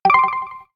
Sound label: Lazer, notifications; Coolpad; Note-8